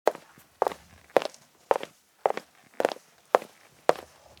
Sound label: Footstep Sounds as Rhythmic Auditory Stimulation; Gait Rehabilitation in Parkinsons Disease